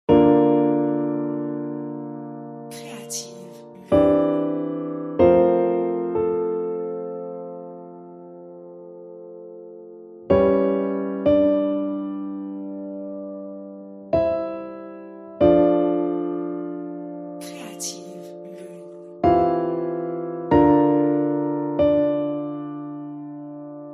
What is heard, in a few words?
Crossing the Desert orchestral